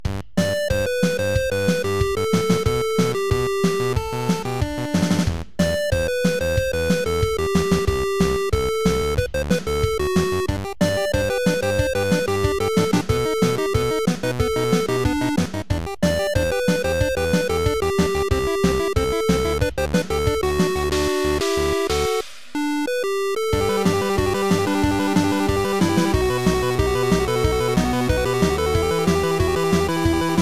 8 bit; cosmic cookie

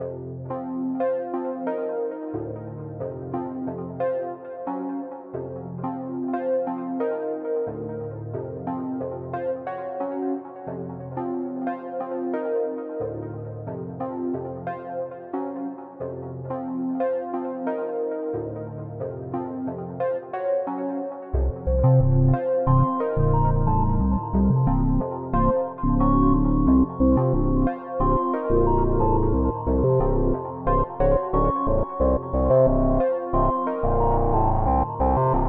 theme chill

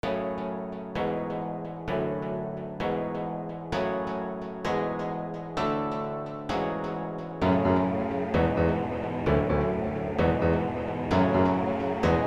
boss koto

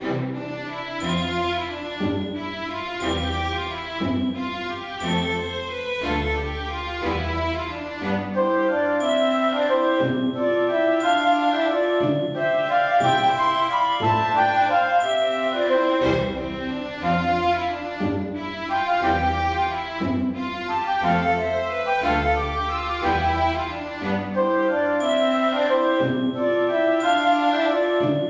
Waltz

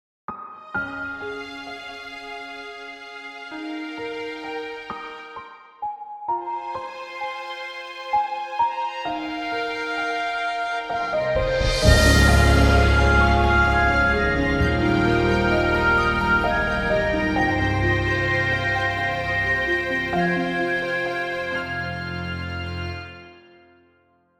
logo music

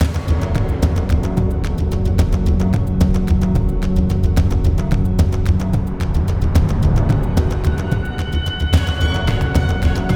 battle

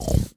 Eat Sound

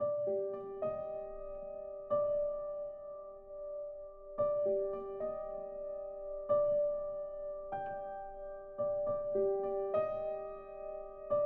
piano theme, simple horror piano music

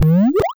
seal pup shark

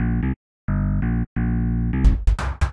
wacky wobblings intro

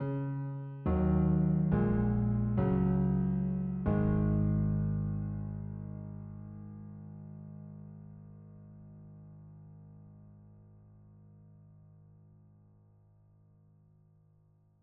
dark stinger

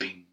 bing; boings

